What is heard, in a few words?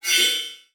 Multimedia Internet CD-Rom Flash Short